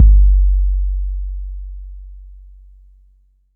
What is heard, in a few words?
Hard Kick